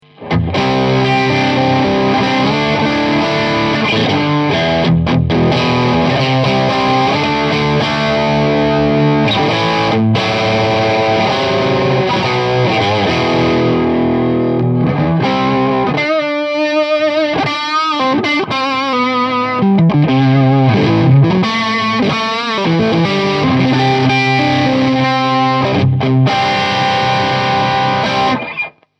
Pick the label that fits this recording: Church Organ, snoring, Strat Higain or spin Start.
Strat Higain